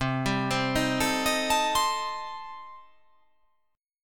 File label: C Minor 6th C